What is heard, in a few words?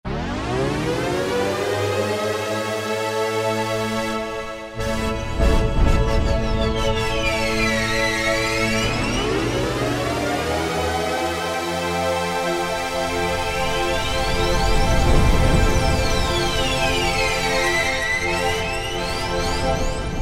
Ascent, Alesis QS8; Factory Preset examples